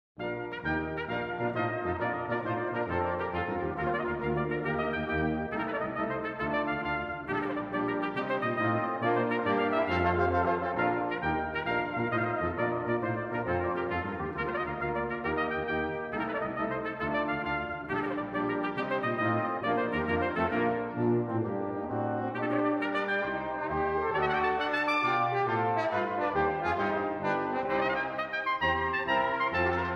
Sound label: Concerto in B Flat Major, Allegro, Brass Quintet; Atlantic Brass Quintet; A Musical Voyage